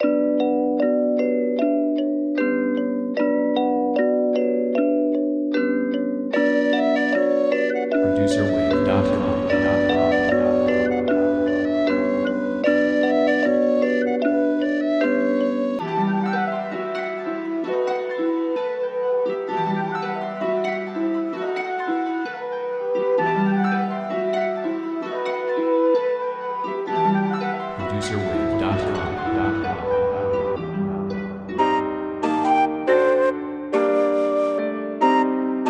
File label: Loop Kit; WavSupply; Manso; Radioactive